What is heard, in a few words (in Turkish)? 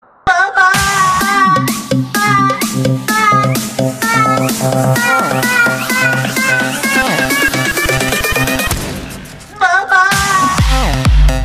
asansorde kalan cocuk remix